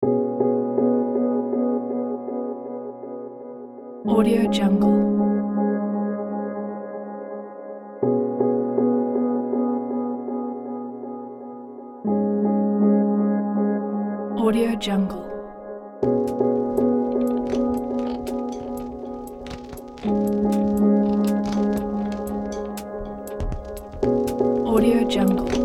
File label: minimalistic soundscape; aquavitae